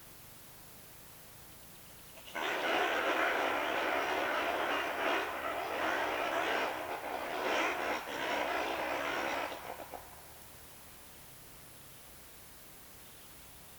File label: Propithecus Verreauxi Roaring Bark, Kirindy